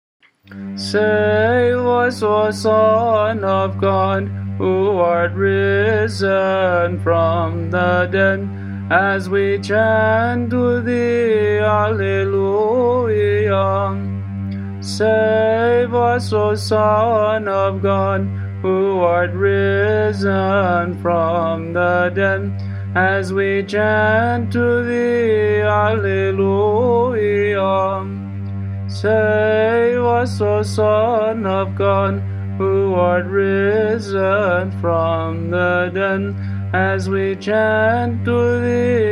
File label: Save Us Gr Eng, byzantine chant; divine liturgy in byzantine chant